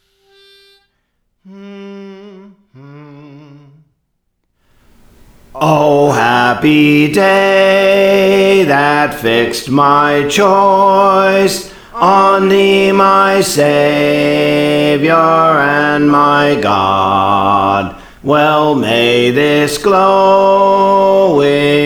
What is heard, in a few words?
Bass, Songs; Praise For The Lord Training